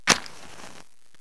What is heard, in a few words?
MATCH